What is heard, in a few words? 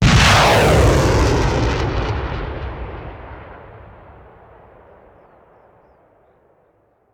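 Artillery Missile Launcher; Sound Ideas; Guns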